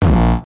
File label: Frenchcore Kick